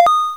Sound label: Replay